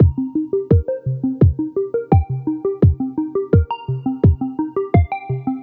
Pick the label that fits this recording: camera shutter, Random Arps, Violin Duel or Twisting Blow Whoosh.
Random Arps